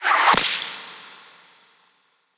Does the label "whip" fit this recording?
Yes